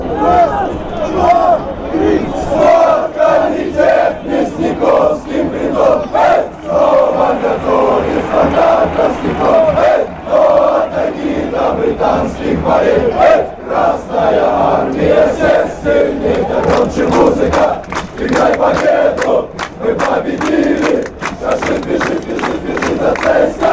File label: cska chant